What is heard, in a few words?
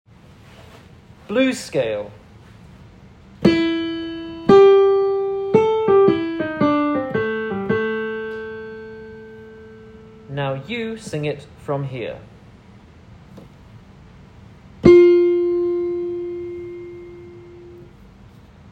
Blues Scale